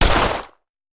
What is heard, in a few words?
Hand Gun; Sound Ideas; Guns